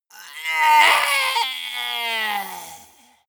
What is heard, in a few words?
monster die high